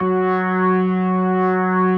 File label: F#3S, B3, Vintage Keyboards